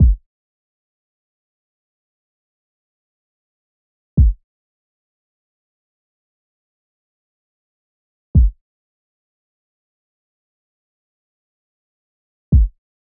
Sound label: 115 BPM A Min Kick; Loop Stems; Cymatics; Infinity Melody Collection; Palm Trees